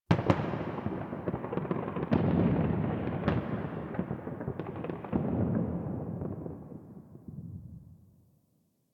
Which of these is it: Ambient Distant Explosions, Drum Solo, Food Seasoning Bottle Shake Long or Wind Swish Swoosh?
Ambient Distant Explosions